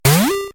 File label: ball poof